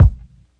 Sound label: classic hh Dnl kickick, Classic Hip Hop; STYLE KITS (Hip Hop, Etc); Classic Hip Hop Mega Kit